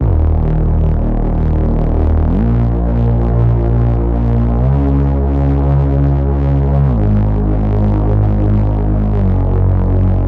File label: Serum Reese